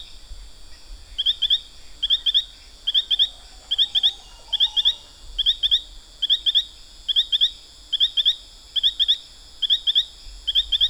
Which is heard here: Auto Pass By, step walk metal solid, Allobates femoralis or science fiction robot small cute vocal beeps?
Allobates femoralis